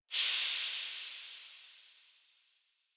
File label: robot steam release; boss